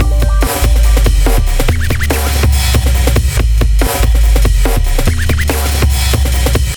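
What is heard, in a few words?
142 D minor, Hardcore Breakbeat; Big Fish Audio; Hardcore Breakbeat Action; Shake That Booty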